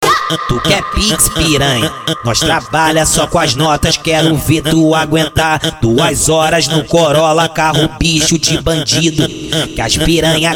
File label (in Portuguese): funk consciente e funk ritmado